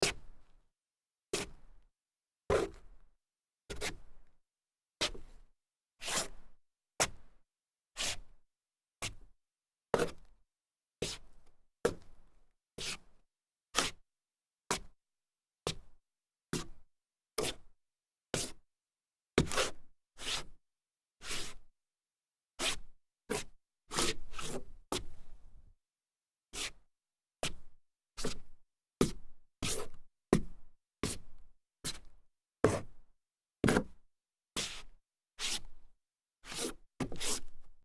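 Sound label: sneaker scuff solid wood, foley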